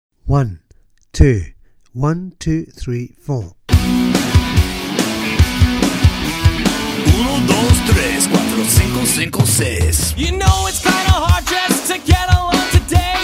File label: (bass)